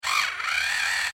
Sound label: Servo Mechanical SciFi Robot Toy, Scifi Servo Mechanical Robot Small Motor Toy